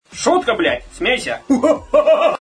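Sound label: joke laugh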